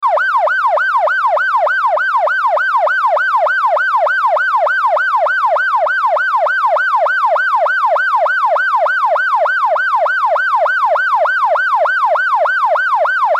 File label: police siren noise